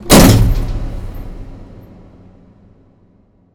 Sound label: impact hatch; Hits, Impacts